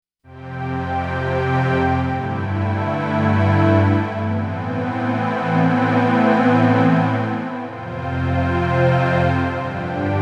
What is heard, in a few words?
silky strings